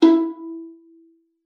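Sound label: violin pizzicato E5; Xsample VOL-5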